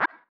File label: Button Up; fruitblaze; netent